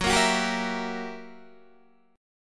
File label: Fsharp 7th Flat 9th down Guitar Open D